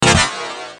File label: laser pistol, futuristic weapons sound effects